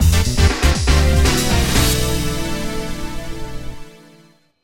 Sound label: Jingle failed; virtua tennis world tour psp gamerip 2005